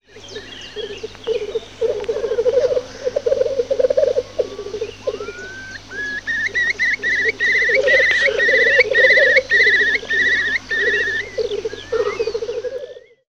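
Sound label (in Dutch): bolderende kriel hoenders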